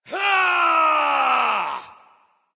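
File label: nonverbal battlecry; smite; voicepack; ullr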